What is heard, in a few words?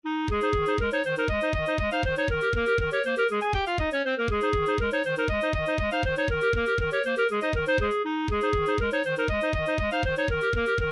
invincibility; gameboy; sequenced; super mario land; firemario